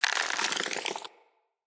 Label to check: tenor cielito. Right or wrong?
Wrong